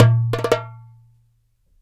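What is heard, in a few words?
DARABKA roll, Mediteranean Traditions percs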